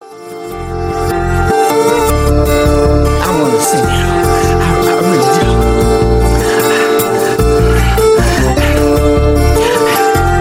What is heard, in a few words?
ringtone